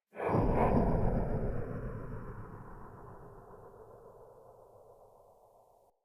Soul Plane random, ambience

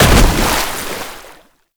Land Heavy Water; Movement; Warframe; Uriel

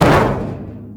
Metal Barrel Impact; Warframe; Ambience; Nineteen Ninety Nine

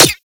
Rifle Mech; Weapons; Warframe; Corpus; Ruban

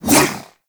Kunai Whoosh; Warframe; Weapons; Stalker Kunai

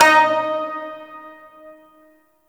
Shawzin Oct Two D Sharp; Warframe

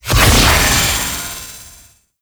Invisibility Enter; Warframe; Infested Lich

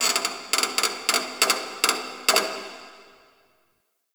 Metal Creak Small, Ambience; Warframe; The New War; Zariman

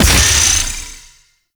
Archer Disengage Ability Explode; Warframe; Duviri; Dax Archer; Abilities

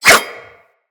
Dagger Whoosh; Weapons; Dual Daggers

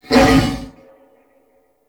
Wolf Attack; Animals; Duviri

